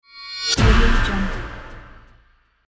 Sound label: cinematic impact